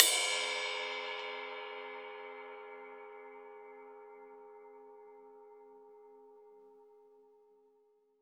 Drums Acoustic, RAW KIT; USB Soundscan vol.10; AKAI; RAW KIT 6

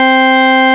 Gritty B Wave C3; Proteus 2000; Multisamples; Digital Sound Factory Proteus 2000

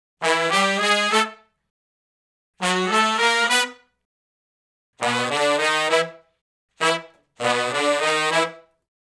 R&B Riff IV, V, I (E) unison, Horncraft for R&B; Sections; Sonic Foundry (Sony Creative Software)

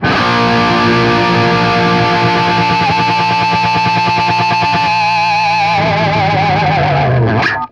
POWER A#, POWER CHORDS, guitar; East West - Steve Stevens Guitar Samples Collection

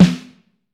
GRETSCH, Snare Drum, POWER HITS; Vintage Snare Drum; Q Up Arts - Johnny.C's Vintage Snare Drum

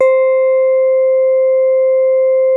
SIDE SOFT C5, SYNTH PLUCK

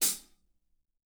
hat, drum; 90s sample CD

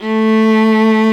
A2 SOLO VIOLA; InVision Interactive; Lightware VOL-1 - Instruments & Percussions

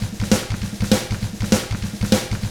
SHUFFLE, Double Platinum Drums; ILIO; Double Platinum Drums 1

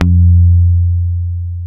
Pick F 3, Bass, Rock Pick; Spectrasonics; Bass Legends

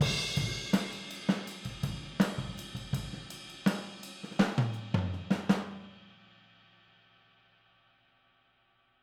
Boogaloo - Outro - Overhead, 82 BPM

